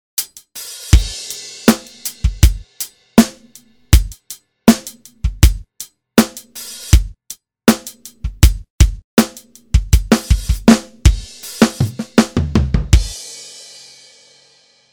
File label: Vinyl Acoustic